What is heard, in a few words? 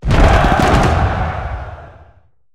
battle start